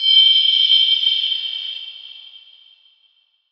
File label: cell Damage; Leucocyte; CARRIER